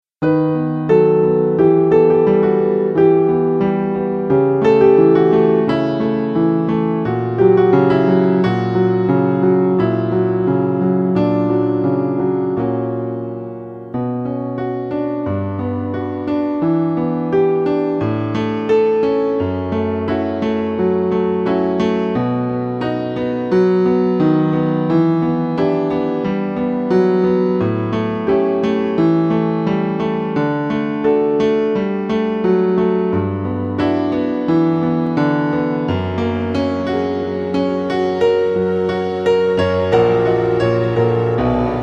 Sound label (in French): Sirene ocean inst; Chants de la Chorale de Cycle 3 - Année 2015/16; Version instrumentale